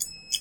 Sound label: Lift Button; Running Late Game